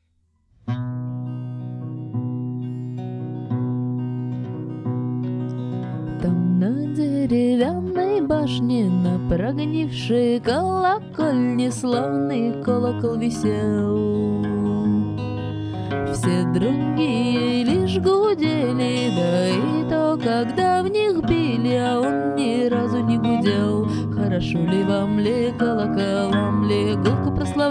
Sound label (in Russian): Колыбельная о старом колоколе